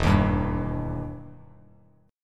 E Minor Major 7th E down Bass Standard; EmM7 strummed

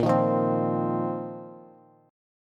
A sharp Suspended 2nd Suspended 4th A sharp, Bass; strummed; A#sus2sus4; JGuitar

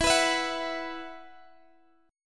B Suspended 4th, E, chord

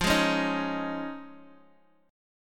F Minor 7th Sharp 5th, Guitar, DADGAD

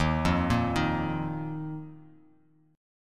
Eb Suspended 2nd down; Ebsus2 arpeggiated; JGuitar

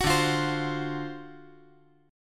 D 7th Sharp 9th D down, chord

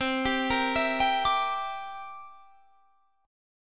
G Minor 6th C; Gm6/C; arpeggiated

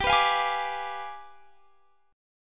G 7th Flat 9th, Guitar, Standard; x,22,21,22,21,22; G7b9 strummed; capo=2

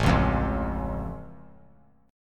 A Augmented A down; strummed; JGuitar

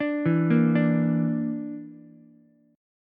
D Suspended 2nd Sharp 5th D Ukulele; 7,4,6,5; arpeggiated

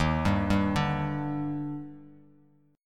D sharp Major 7th, Bass Standard; arpeggiated; JGuitar

